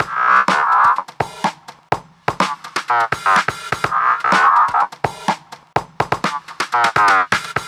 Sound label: Schranzinger, percussion